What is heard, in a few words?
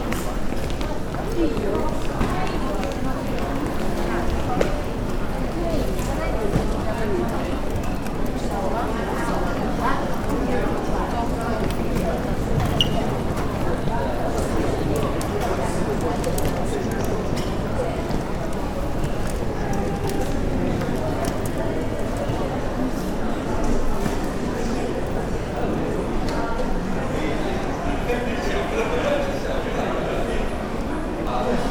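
Narita airport arrival, tokyo; 成田空港到着口３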